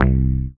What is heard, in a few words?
DM BAS, Dance Mega Synth; Best Service